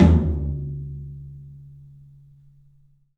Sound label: 3-D Percussives, LOW Toms